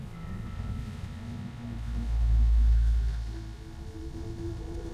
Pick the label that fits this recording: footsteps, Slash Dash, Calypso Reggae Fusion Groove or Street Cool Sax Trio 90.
footsteps